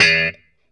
POP E2, STRAT guitar; Spectrasonics; Hans Zimmer Guitars VOL-1; JC-STRAT POP

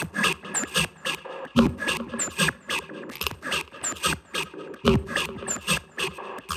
Top Loop Burst BPM

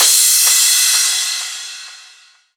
Crash, Cymbals

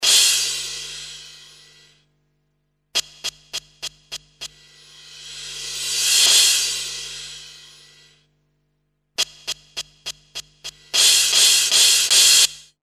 Crashy, Cymbals; Industrial

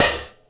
Vintage Closed Hi-Hat; Drum n Bass; Hi-Hat One-Shots; KJ Sawka; Drum n Bass & Beyond; Drum Tool Box

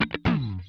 COOL SWOOP, Funk Guitar; Zero G - Funk Guitar

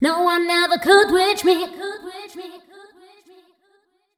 f mel f, fem Vocals

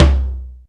TOM LO; TOMS; Back In Time Records; Big Bang

